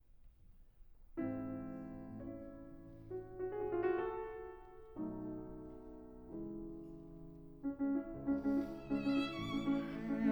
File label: Andantino, piano trio; Six studies in canonic form